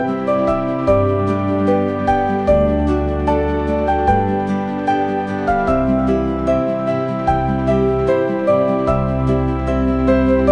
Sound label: Exploration, music; rpg